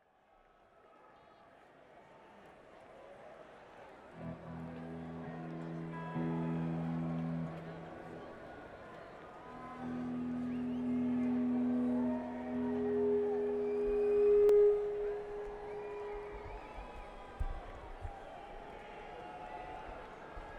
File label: Tuning; Wantagh, NY; Nikon at Jones Beach Theater